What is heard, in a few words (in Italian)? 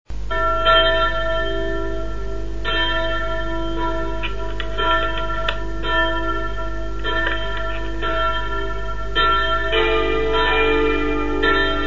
Campane; Campane Dardago